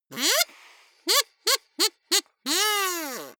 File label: kazoo laughter